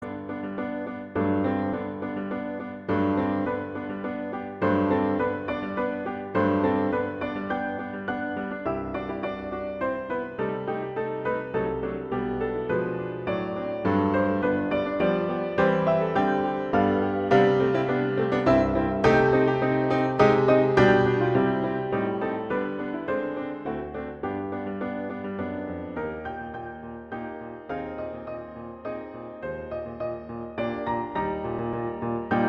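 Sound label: Allegretto, violin; Lalo; Four Hands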